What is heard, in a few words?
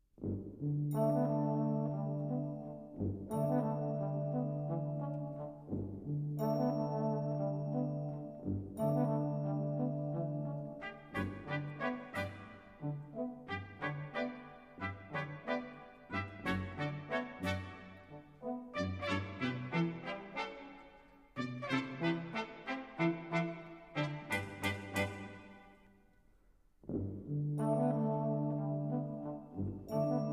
brass